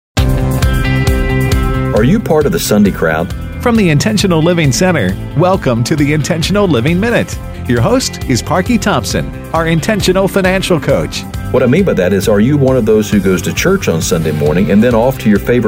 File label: Sunday Crowd